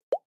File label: ios18 react to message; Системные звуки и уведомления iOS 18 (iPhone 16, 16pro, iPad)